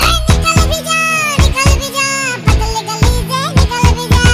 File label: funny Sms Tone